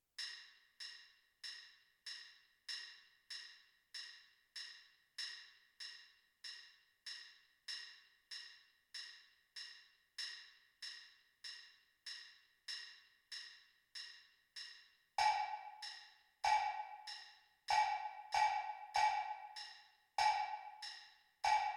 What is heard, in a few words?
wood block